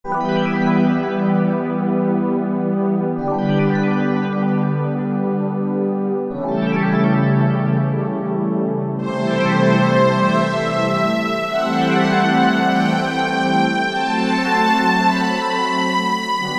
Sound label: Korg T3